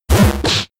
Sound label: Punch; Shadow Punch